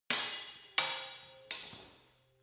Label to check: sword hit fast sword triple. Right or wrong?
Right